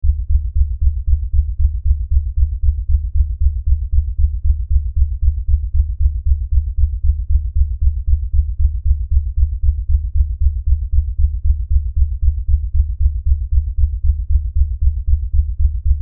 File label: Lfe Pulse Low Bass; Drone; Sound Design